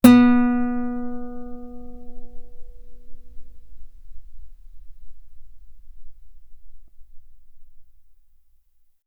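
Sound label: Guitar ff B string B3; OmniPower4296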